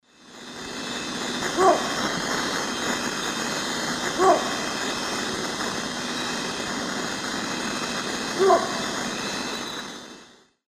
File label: Nyctibius grandis, call; S. America; Eastern Lowlands